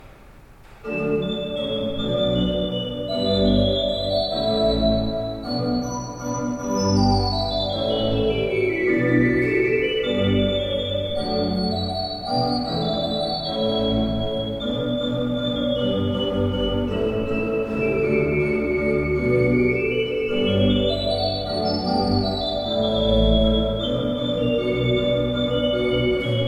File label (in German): Hammer 22 II P, orgel